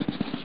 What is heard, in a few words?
walk noise; Amiga Soundtracker sample